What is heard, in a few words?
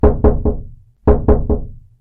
knocked in a bathroom